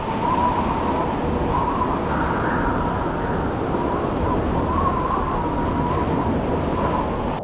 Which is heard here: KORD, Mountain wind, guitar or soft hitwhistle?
Mountain wind